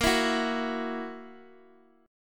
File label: F sharp 7th, A sharp